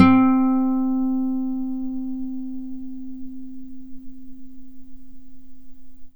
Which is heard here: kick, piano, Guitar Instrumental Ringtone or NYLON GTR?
NYLON GTR